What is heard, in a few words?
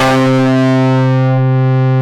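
distorted guitar C2, AKAI S-Series; AKAI S-Series CD-ROM Sound Library VOL-8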